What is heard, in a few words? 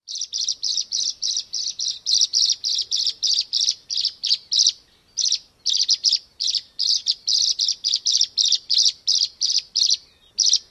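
calls, Tree Swallow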